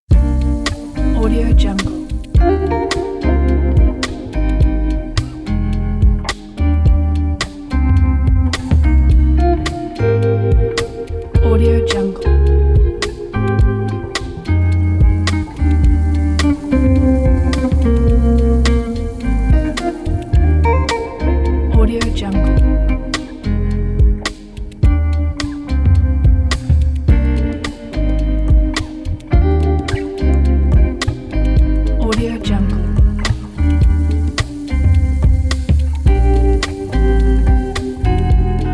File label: jazz guitar rnb